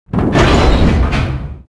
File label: ramp start; movers platforms